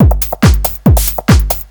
TECHNO BEATS 140

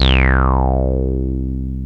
TB BASS; TB-303

